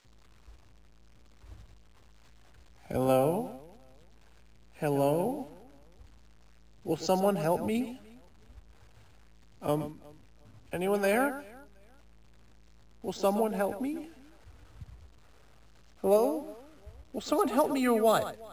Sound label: Will Someone Help Me Acapella